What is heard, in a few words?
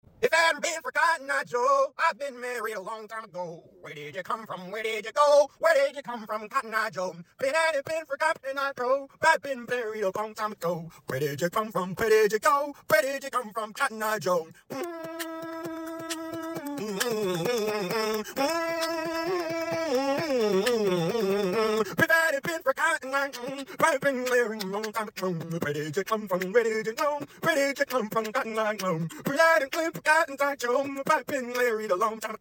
Cotton eye joe beatbox full version